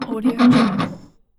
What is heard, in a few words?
old schooner boat fresh water tank cap